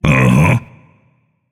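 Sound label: non-verbal agree; smite; atlas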